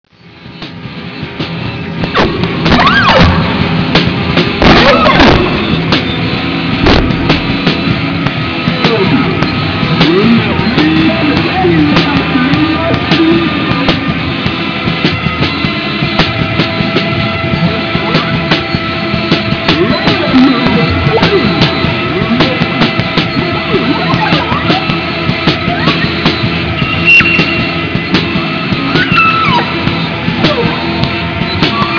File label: THEREMIN